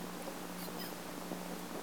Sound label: Inia geoffrensis; Silver Springs, Fla. 3-Oct-1956